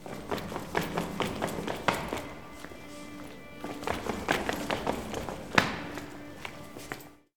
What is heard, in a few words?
Downstairs Walk